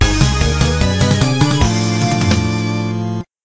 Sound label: Game Start; Dracula's Shadow